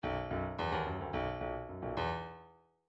bdf midi file grooves rock d rock d

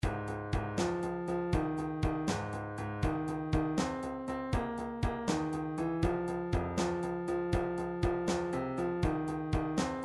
midi drums, blues

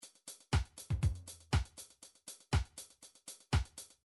midi drum patterns drums reggae

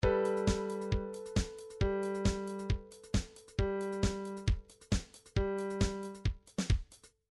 trance midi loops beats sfx alien; Newtronic Trance Constuction Kit

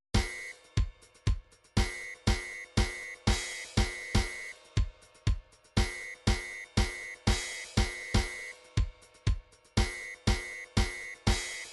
drums, midi drum patterns; midi drum patterns vol 1 to vol 4

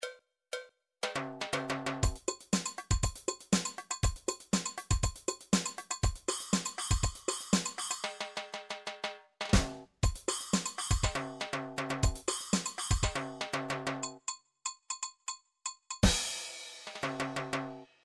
midi drum patterns drums salsa

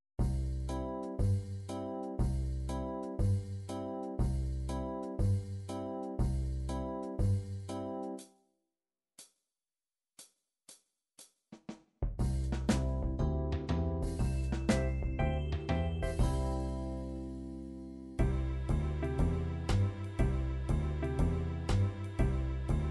swing, midi

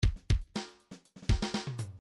midi file fills funk fills funk fill

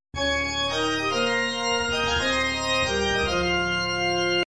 Super Nintendo stage clear, midi; super castlevania iv

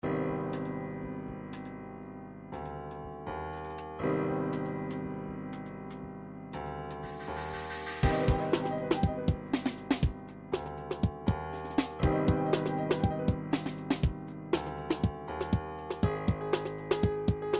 triphop; Newtronic Triphop Construction Kit

